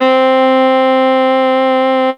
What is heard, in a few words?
sax